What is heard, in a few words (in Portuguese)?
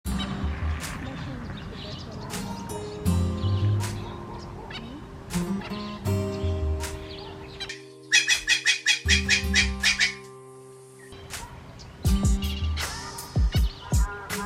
Canto do francolim do natal